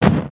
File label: Troll Rock Crunch